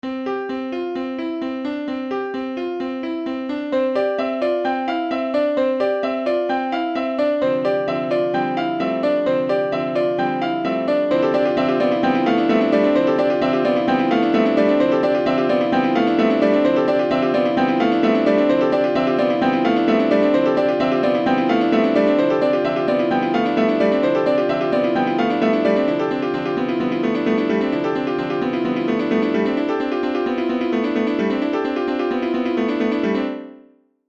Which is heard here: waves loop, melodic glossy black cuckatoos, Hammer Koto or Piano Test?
Piano Test